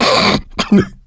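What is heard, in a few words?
laughter, male; survivor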